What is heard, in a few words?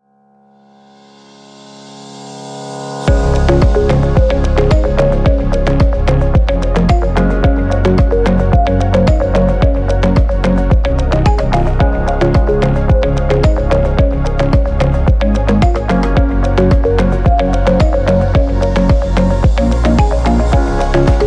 technology innovation corporate inspirational